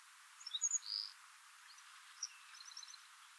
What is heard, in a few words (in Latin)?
Amphispiza bilineata